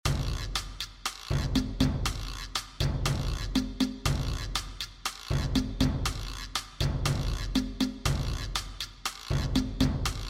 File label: perc